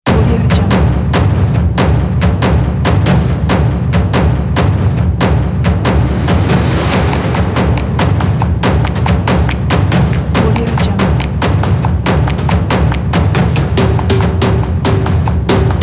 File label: cinematic drums and percussion; brillianceaudio